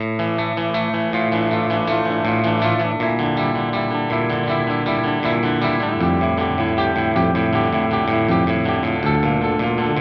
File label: Clean; Sterling By Music Man Richardson Signature